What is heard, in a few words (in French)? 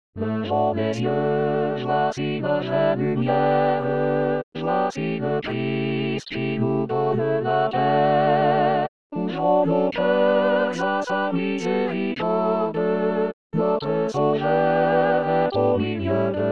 les 4 voix, chorale